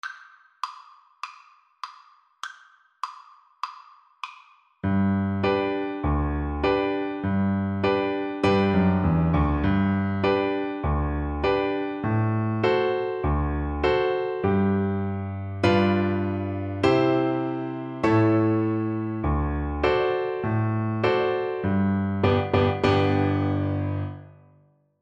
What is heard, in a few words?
cello